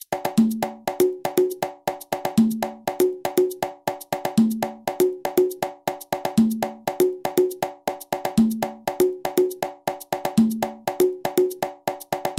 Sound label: guaguanco habana repicador